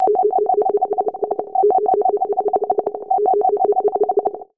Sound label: RATTTLE, RHYTHM; AMG - Vince Clarke Lucky Bastard